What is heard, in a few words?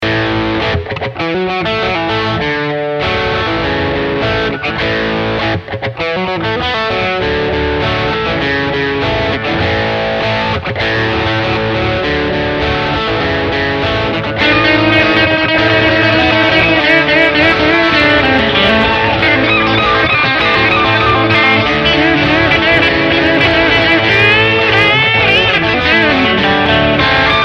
rock